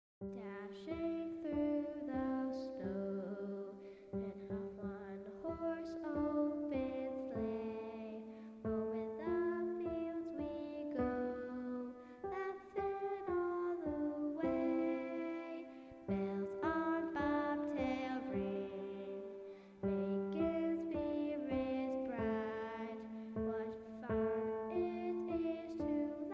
Jingle Bells Vocals

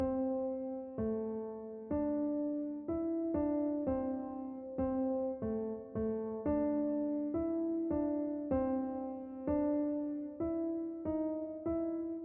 Jazz Walk

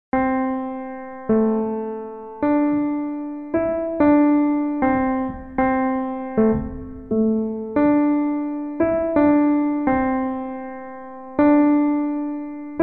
Jazz Walk

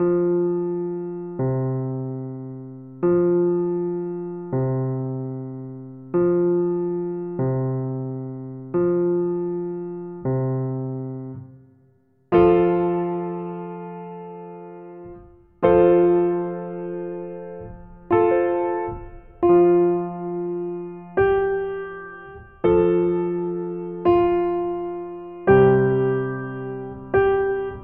fanfare

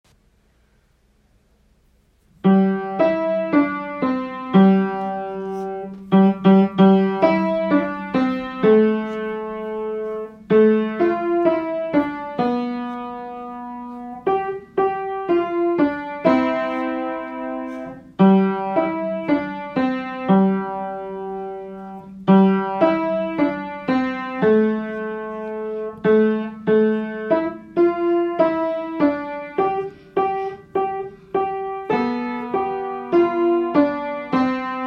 Jingle Bells Home Recorded

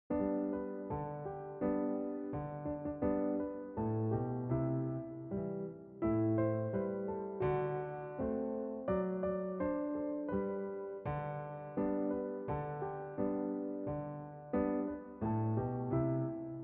Jingle Bells

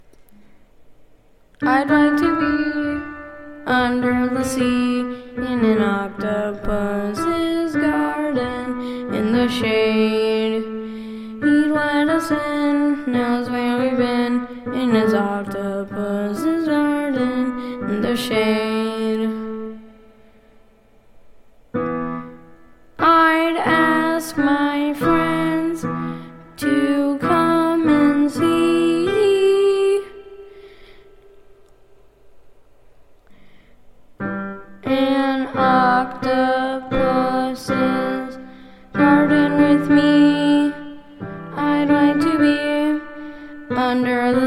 Vocals

